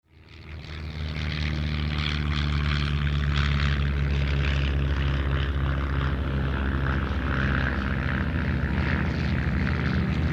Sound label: biplanes passing by